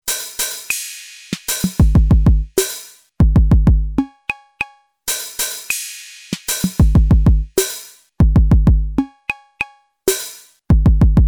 A drum machine program

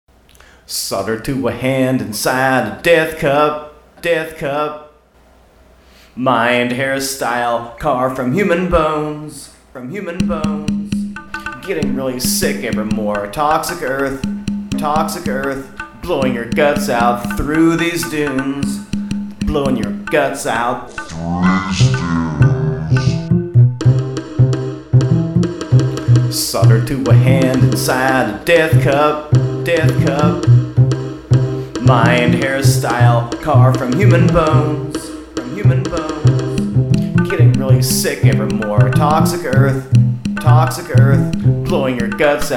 singing bells

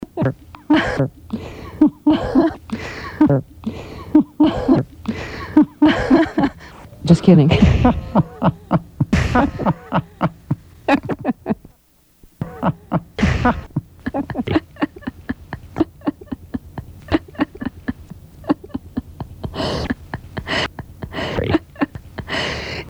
Giggle

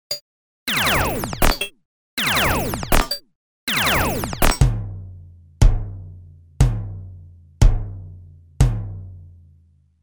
Drums shred

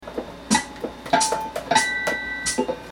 on guitar

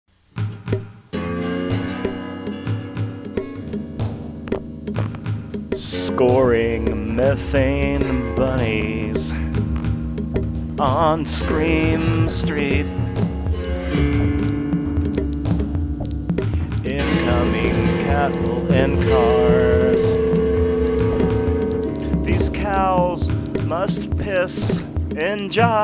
Scream street

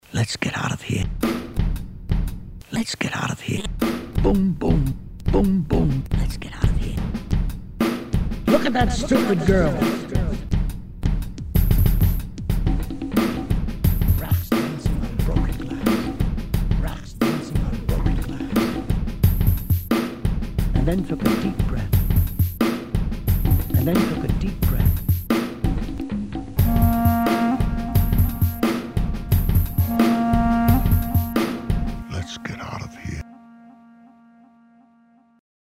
funk; Non-Euluclidian funk; shasta dependacy mix

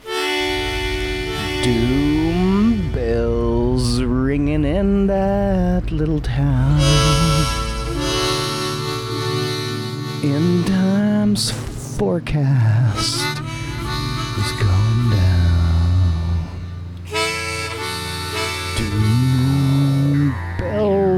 Doom Bells Ringing

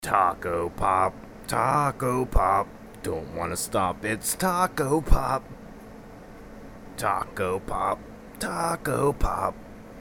Taco pop jingle

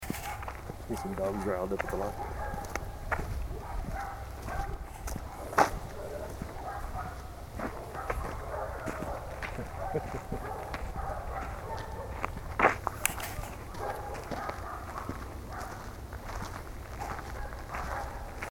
Dogs defend grounds from street walkers

